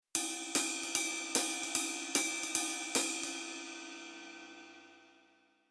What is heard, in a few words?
Rhythm Basic Velo HH Ride